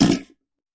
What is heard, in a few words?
Bowl Fart Sudden